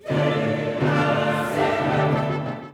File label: orchestre orig short chanel right